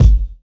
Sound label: clear kick one shot g sharp key, bass drum sound tuned to the G# note